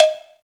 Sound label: Metal, Percussive FX; musicradar; cartoon caper